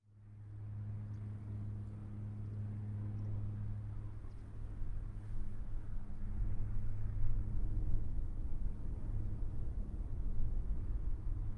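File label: cessna 208 exterior land by; ortf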